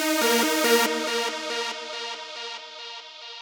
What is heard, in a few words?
140 House Leadsynth Ebmaj; Dance; DJ; Pro Tools Essential Loops; Digidesign